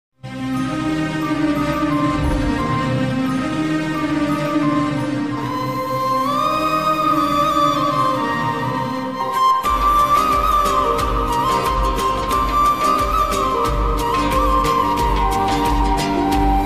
odia movie bgm romantic ringtone odia movie love romantic; agastya; anubhav mohanty; jhilik